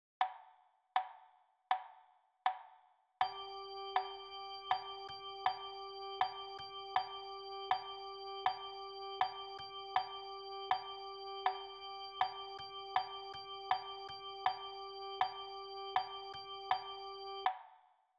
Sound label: Rhythm Practice G note